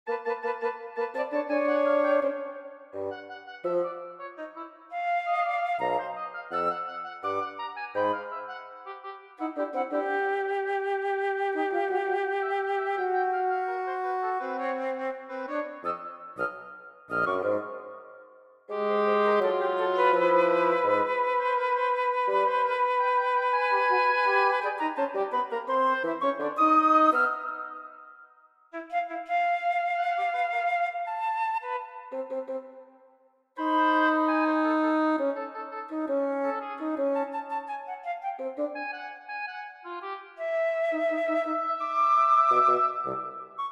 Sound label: WW Trio